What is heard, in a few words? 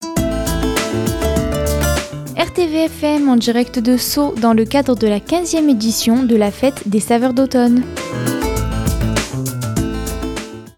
Jingle; fete de sault